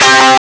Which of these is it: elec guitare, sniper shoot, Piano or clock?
elec guitare